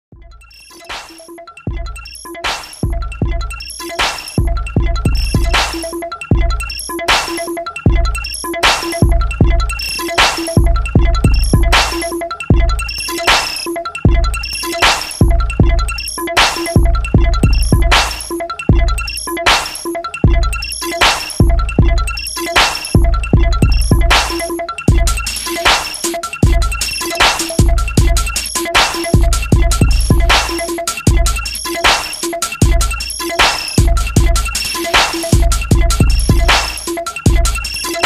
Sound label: bare blips (bonus beats)